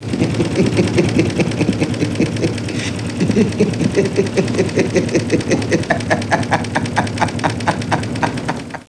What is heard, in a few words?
laughing